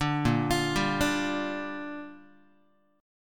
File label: A sharp 6th, Guitar; arpeggiated; JGuitar